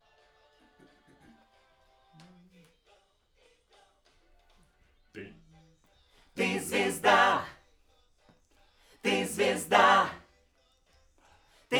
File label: Backs AKG